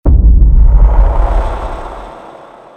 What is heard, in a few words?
jumpscare; bron pack